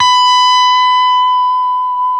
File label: LES PAUL LD, LESP MF B 5; Spectrasonics; Hans Zimmer Guitars VOL-1